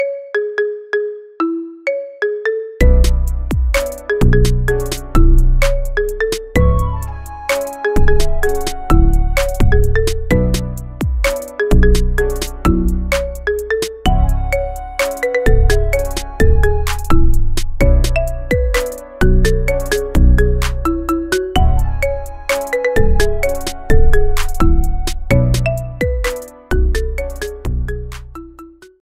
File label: Marimba Cover